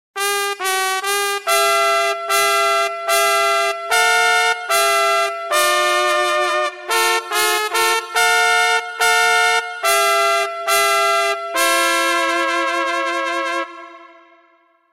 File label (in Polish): trąbka